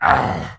Troll Wound; Trollgore

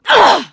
Wound; Sif

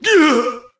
Wound Critical; Varimathras

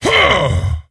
Attack; Kologarn

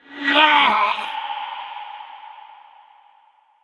Wound Crit; King Terenas

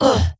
Wound; Tenebron

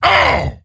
Wound; Kologarn

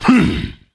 Attack Light; Varimathras

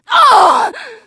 Wound Crit; Sif

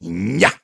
Attack; Voljin

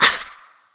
Wound Light, Creature; Eydis

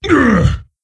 Wound; King Ymiron

